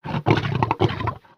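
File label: Pets Triceratops Happy; creatures; Lego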